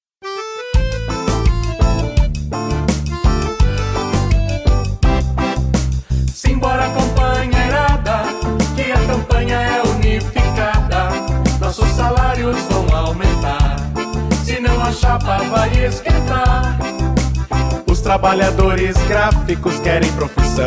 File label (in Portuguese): Jingle campanha salarial graficos